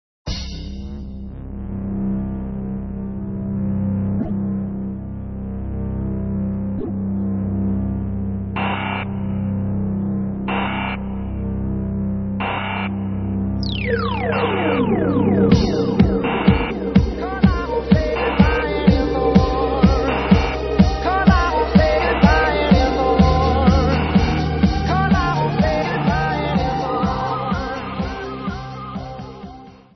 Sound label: radio mix